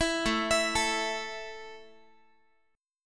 A 5th A down Guitar 4A,4E,5A,5D,5G sharp,6C sharp, A5 Chord; arpeggiated; JGuitar